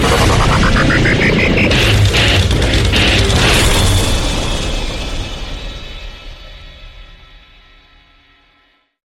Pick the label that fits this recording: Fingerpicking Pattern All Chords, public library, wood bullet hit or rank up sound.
rank up sound